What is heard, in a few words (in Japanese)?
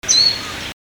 mejiro